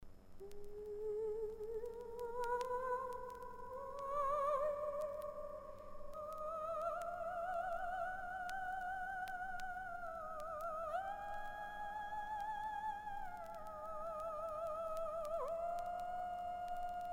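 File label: very scary sounds and screams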